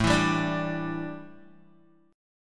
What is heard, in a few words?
A 7th Suspended 4th, A, down, Guitar; A7sus4; strummed; JGuitar